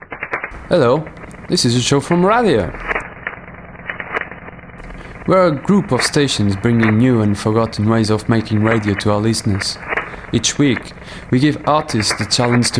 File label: domestic noise; p-node